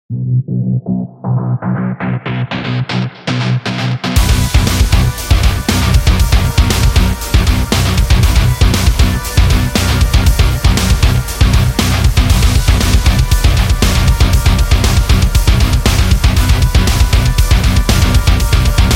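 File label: Rude, metal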